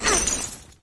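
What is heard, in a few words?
grenade throw; video games; weapons; source engine; dod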